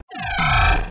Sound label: Space Swoosh